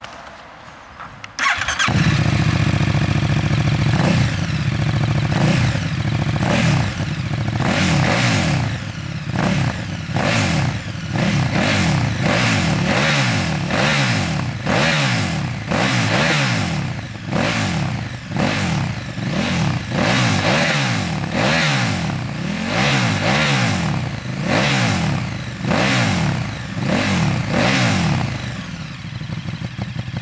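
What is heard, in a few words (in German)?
GPX2 mit dB-Killer, Auspuff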